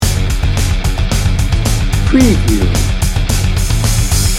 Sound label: metal mayhem loop